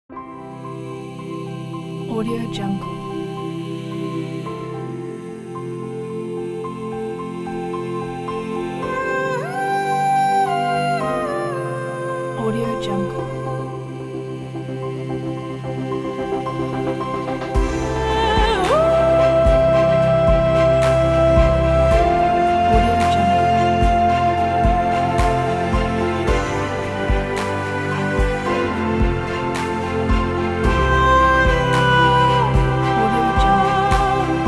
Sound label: motivational inspiring